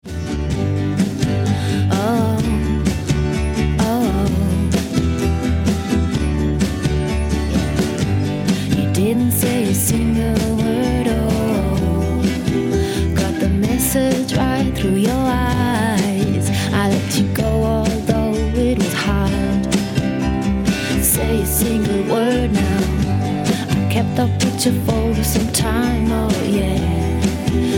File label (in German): gesang; grossstadrevier